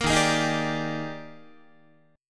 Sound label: Eb Major 11th, Guitar; EbM11; strummed; JGuitar